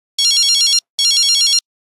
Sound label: Ring ring, Nokia Buzzer; Windows Phone apps